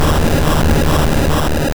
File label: Fire Blast